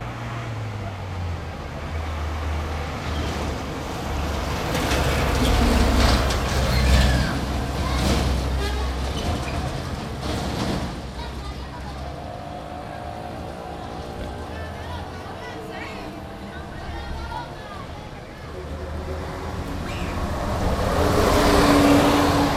cars old cuba, street traffic; best service Blue Box

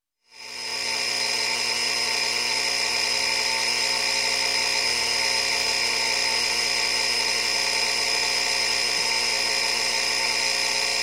Clock, Alarm Clock Radio Alarm Buzzer, Radio; Sound Ideas 2000 Series

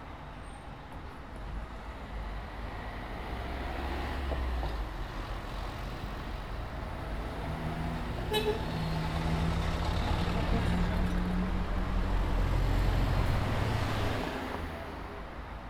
traffic; NS Studios - ultra sound collection

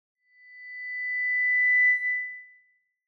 Microphone Feedback - High Tone; Hollywood Edge; sound designer's toolkit v2

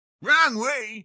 car reaction; Blind Drive

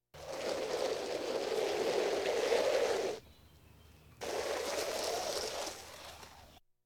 Police, Fire engines

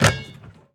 Street Ball Dunk Nylon, Street Basketball

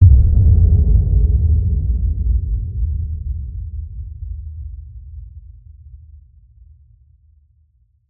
HIT Boom, Cinematic Hits Designed

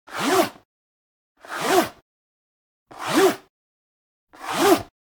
Generic Slide; boom library; The Interface

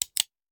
Hand Gun Foley; Sound Ideas; Guns

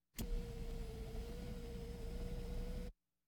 Automobiles various; Valentino Production Sound Effects Library CD50

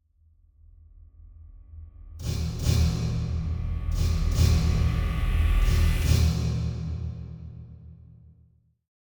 Drum Metallic Hit Reversed - Final Hits; Hollywood Edge; sound designer's toolkit v2

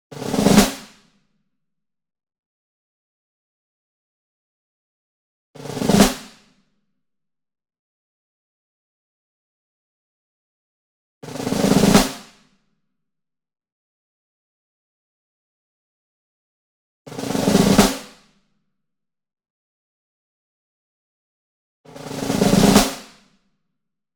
SINGLE SNARE Pearl High Tension Roll Near; boom library; Cinematic Strikes Construction Kit

